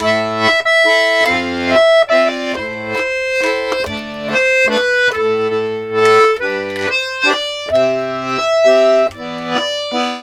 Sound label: Accordions; USB Soundscan vol.40; AKAI